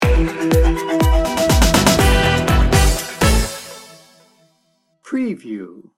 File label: radiant energy sting bumper